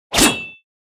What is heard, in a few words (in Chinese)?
重攻击命中